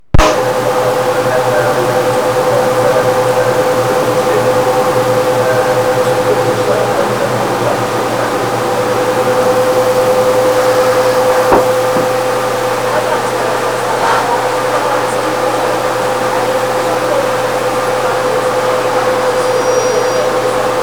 binaural tone and sky news air modulation recording